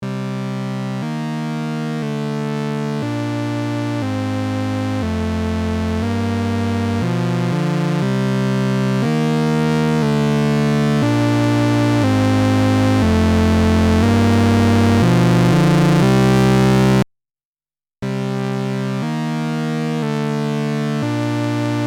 Paraphonic Multidrive

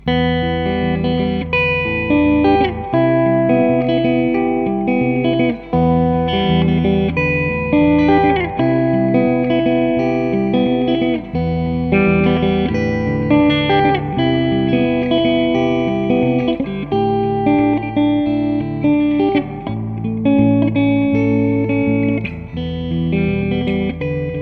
sparkly picking

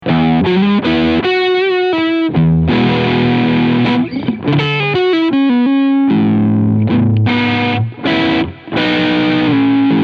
Fender SQ Bullet Tele Overdrive beide PUs

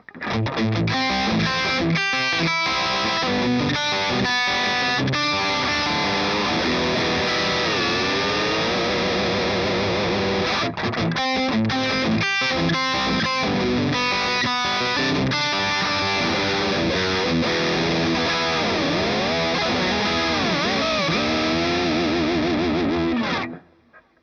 Rock Picking

